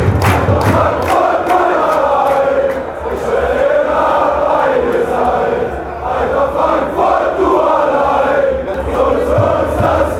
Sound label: oh oh oh, fanchants; eintracht